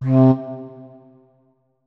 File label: flute notes; Hideout Furniture